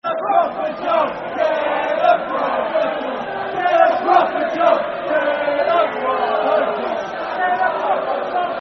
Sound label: Get A Proper Job Football Chant (Soccer Song); Walsall Wfc